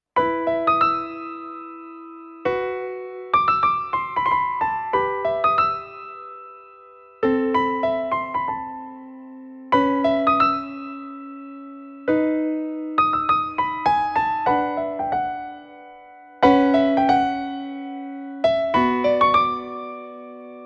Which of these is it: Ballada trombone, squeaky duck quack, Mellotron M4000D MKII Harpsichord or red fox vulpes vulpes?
Ballada trombone